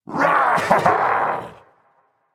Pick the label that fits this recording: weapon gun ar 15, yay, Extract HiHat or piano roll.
yay